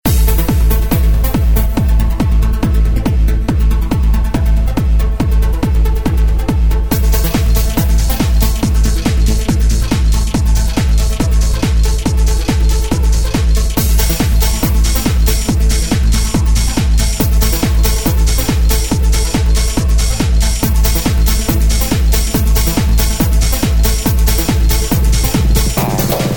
Clubstyle

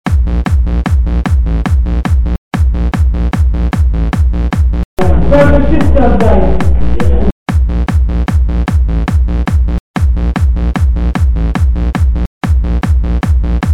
techno

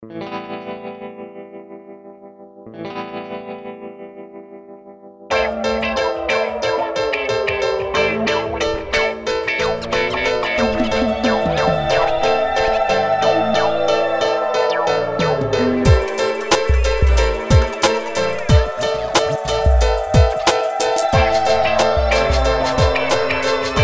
hip-hop

